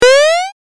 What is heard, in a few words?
jump 8 bit